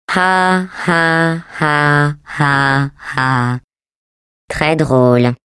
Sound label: laugh, fr; GLaDOS; taunt